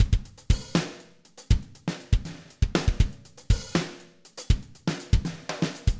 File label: plastic beater sticks; toontrack superior drummer